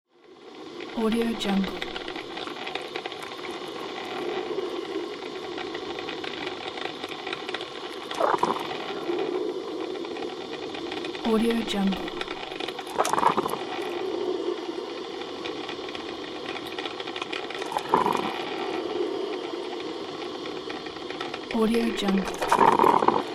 electric coffee maker; palmirasoundstudio

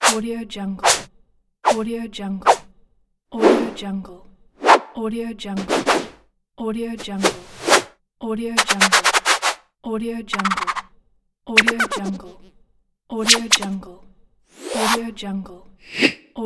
unique whooshes pack; cloudcake

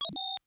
tone clusters mostly high pitch tones; prosoundfx

